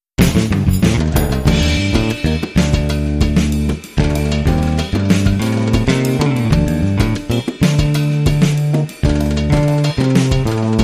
Underscore

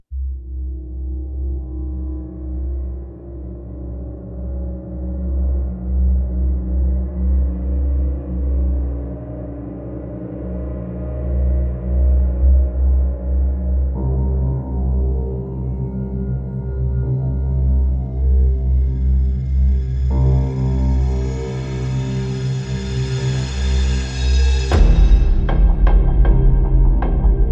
Drums Bass SFX Only

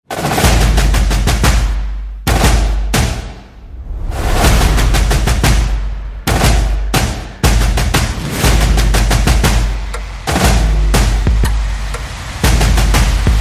Percussion Bass and Effects

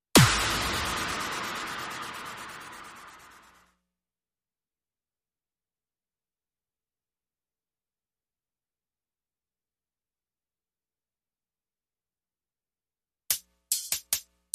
Drums and FX Only

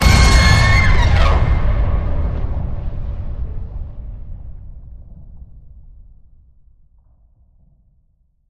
Thunderbird Screech Hit

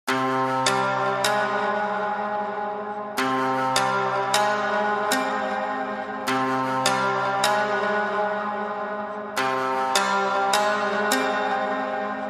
No Drums No Bass

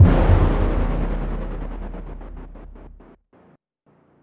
One Shot Impact

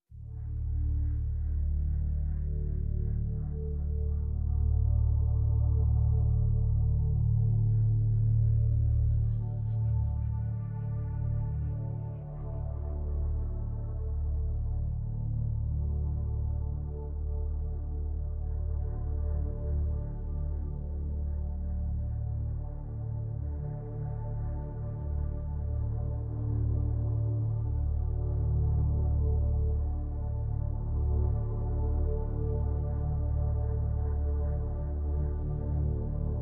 Pads and Pulses

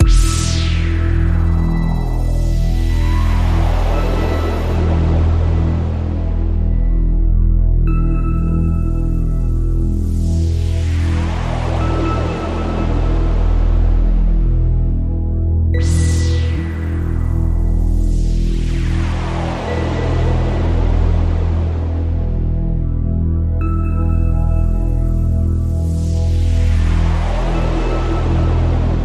Radio Toolkit Pad

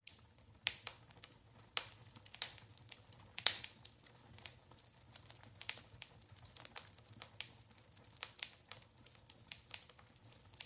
Holiday Fireplace Crackles

